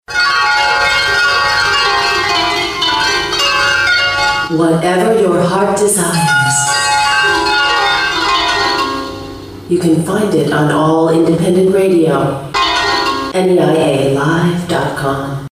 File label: HARP; "Harp" Bumper; New England Institute of Art; midterm re-do